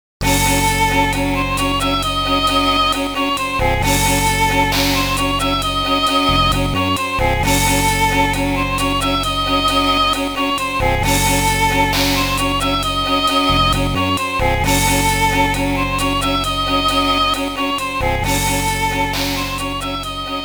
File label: Stage Opening; Slash